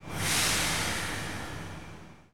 air zero; OpenBVE; Train; Carmillon

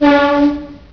Klaxon, Train; OpenBVE

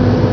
Motor, Train; OpenBVE

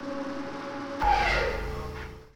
Traction blower stop ext, Train; OpenBVE; Cl313 Silverlink

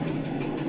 Motor, Train; OpenBVE; EWII BDt

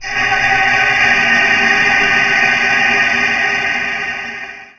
air zero; Mk48 Teher

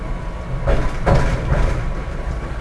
motor, Train; OpenBVE

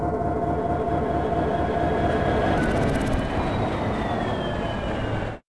Engine Stop, class 66 train; OpenBVE

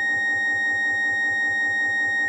Dual Alarm, Train; OpenBVE; Cl313 Silverlink

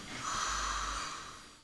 air zero; OpenBVE

